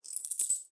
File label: Coins